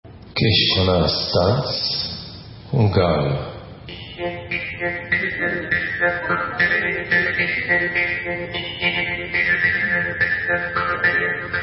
Kiskanasztanc, Hungarian, Instrumental

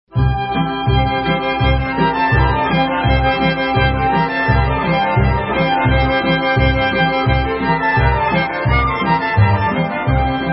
Serbian

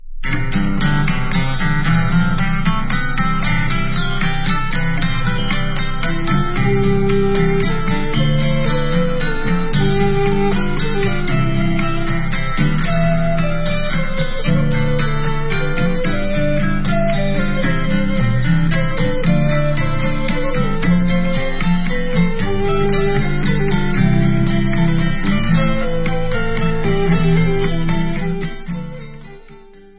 instrumental

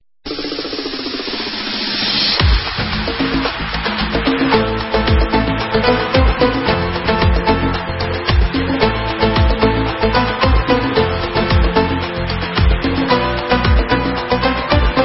Instrumental

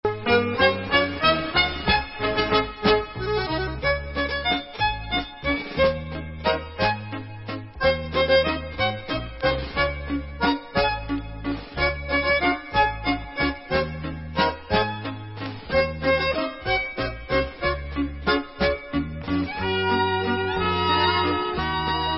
Mexican Waltz Folk Instrumental

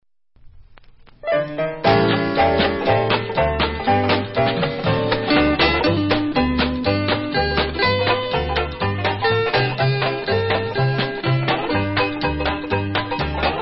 Mixer, Instrumental; Manning's

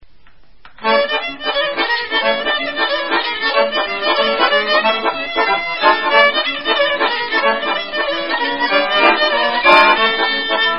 Rumanian

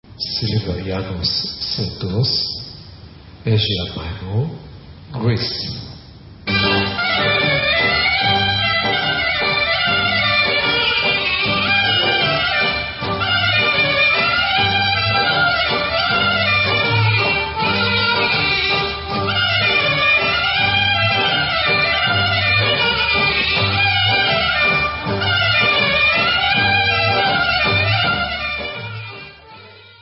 Silivrianos Syrtos, Greek

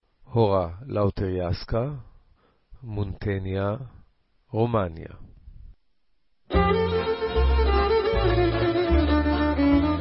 Hora Lautereska, Rumanian, Instrumental

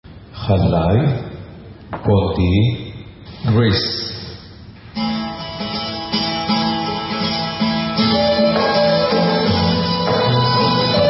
Halay Greek Instrumental

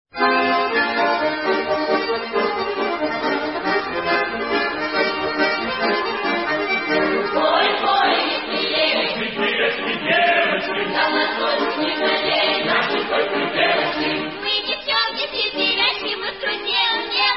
Russian Siberian

